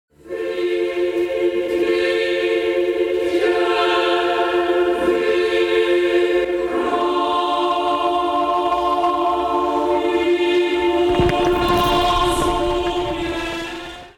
Chorale

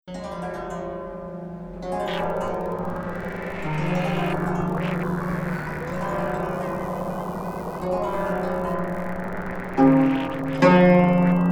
Guzheng Improvisation; Aphasia

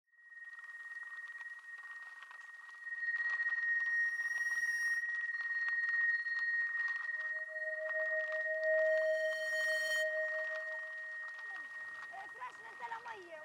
A Fragment Of Distorted Calm

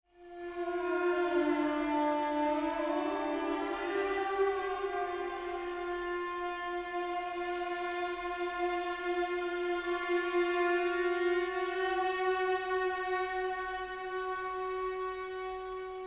String Quartet

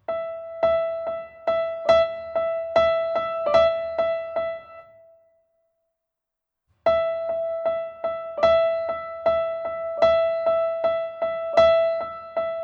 storm; forming and storming

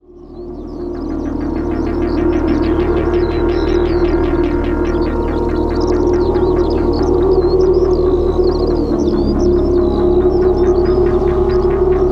Duet

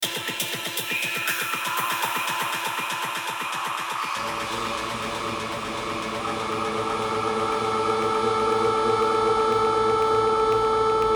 RIVER RIVER

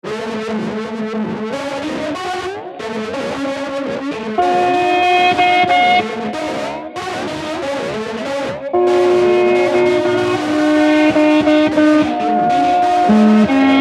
Improvisation

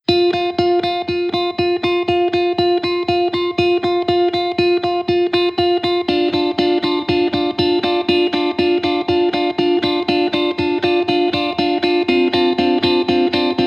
Ukulele counterpoint

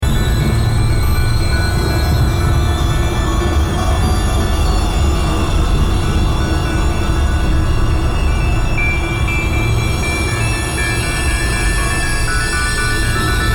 Circling Overhead

